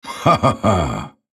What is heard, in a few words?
ultimate effort